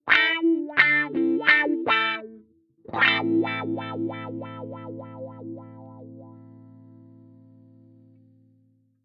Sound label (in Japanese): ワウギター